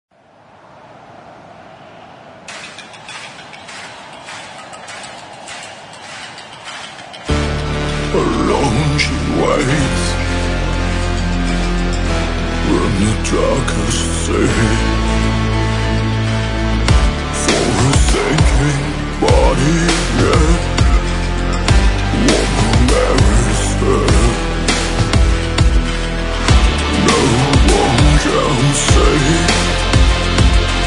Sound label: dark Siren, Metal Hard Rock